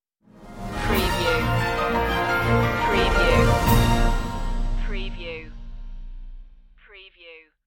news stinger

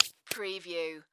Hand Wipe Smack Hand

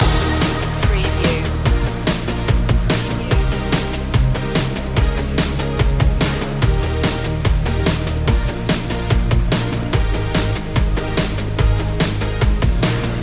fresh energy loop

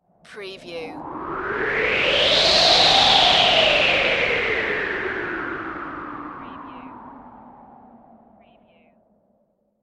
Magical Wind Gust

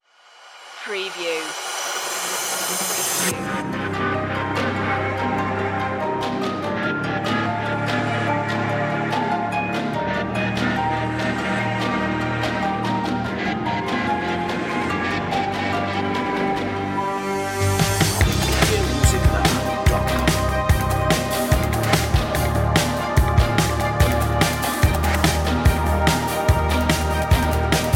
dark hero action

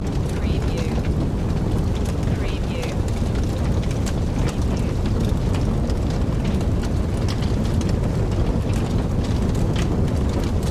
House Interior Heavy; Sound Effects Bible

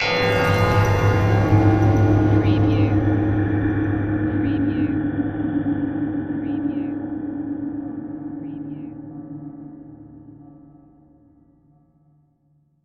Hit Metal

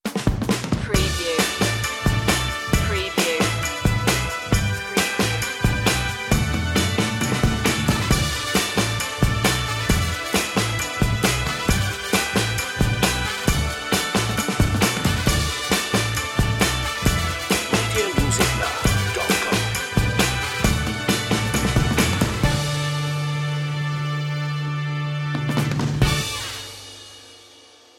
instrumental

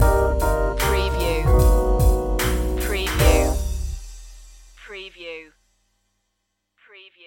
blue funk stinger

